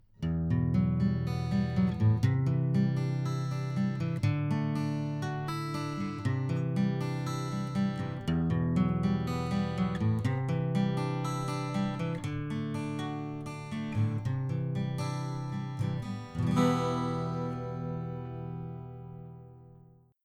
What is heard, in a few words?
Guitar All Figures